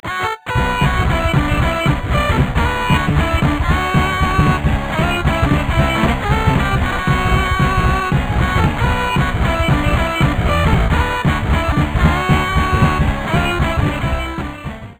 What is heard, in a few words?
karaoke; DELTARUNE